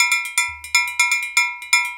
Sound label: BRKDR, Reggae; Spectrasonics; Supreme Beats; World Dance